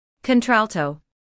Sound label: contralto